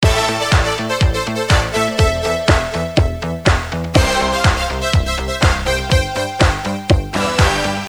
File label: Loop